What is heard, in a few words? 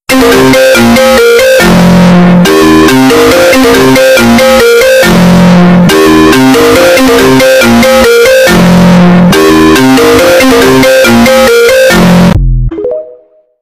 apple rington